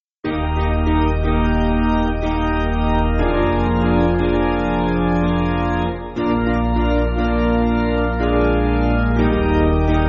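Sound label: Piano, Free Music Backing Files for Churches; Weigle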